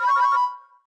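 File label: daVinci Menu Speak Error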